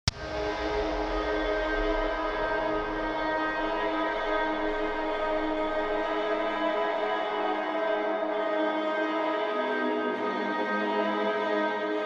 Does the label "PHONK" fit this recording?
Yes